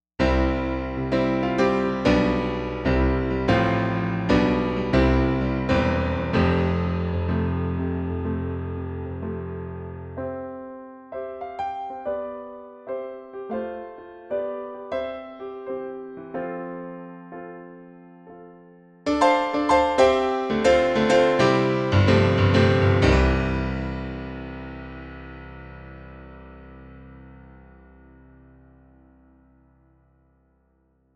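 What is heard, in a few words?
supernatural piano; Jupiter-80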